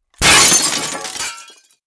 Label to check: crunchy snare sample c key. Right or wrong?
Wrong